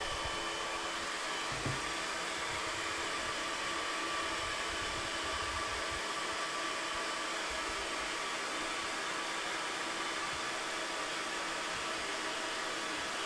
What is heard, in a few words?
Hairdryer